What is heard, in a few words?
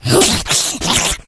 rage, infected, male